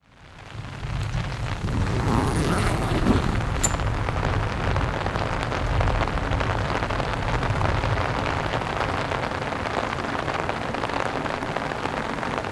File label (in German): Im Zelt, Regen